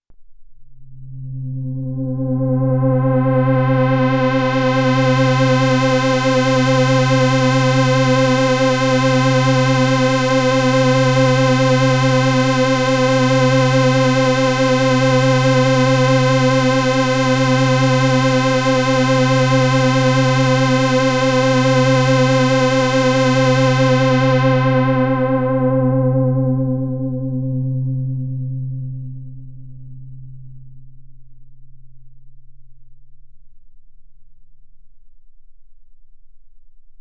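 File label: filter low-pass filter; toraiz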